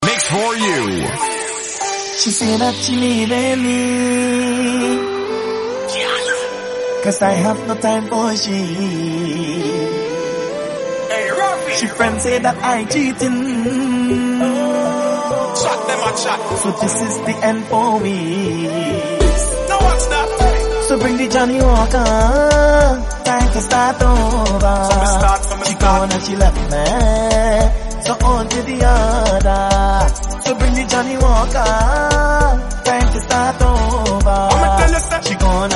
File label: Remix